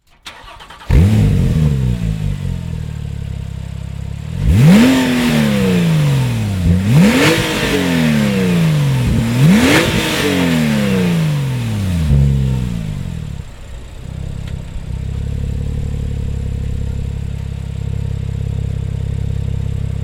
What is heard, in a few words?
Porsche 911 Turbo S